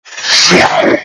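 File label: lizard attack; rustbot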